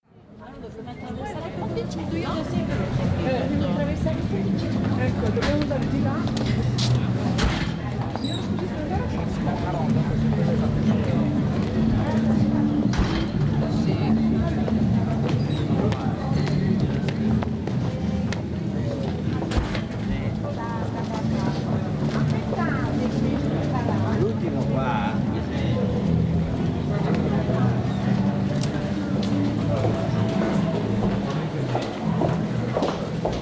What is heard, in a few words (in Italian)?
campo; suoni; campo dei Frari